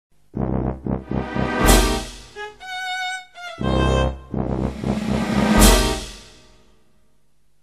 death, music; Goblins3

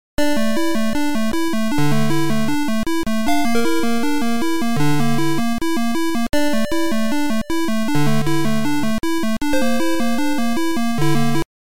Whale, tandy; KQ4

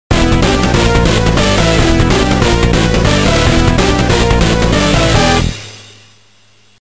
victory; Gladiators

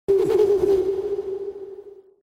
cuica stinger; Bourne

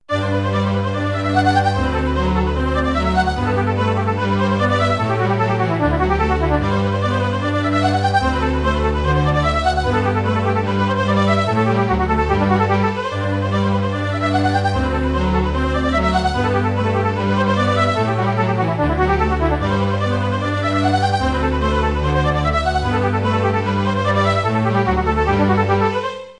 hummingbird; KQ7

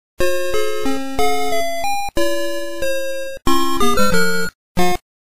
death; KQ3

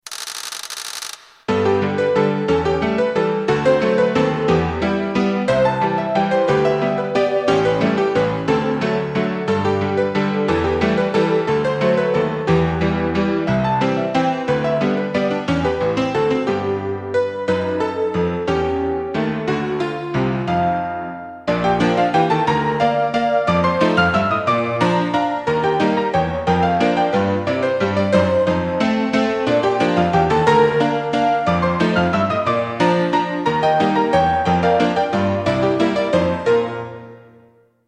Billiard Room Player Piano; LB1

